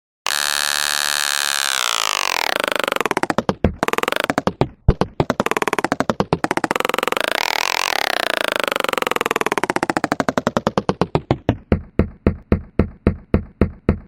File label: click